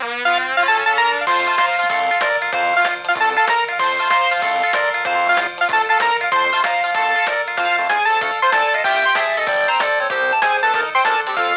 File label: Saints Go Marching